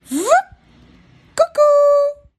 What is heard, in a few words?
cuckoo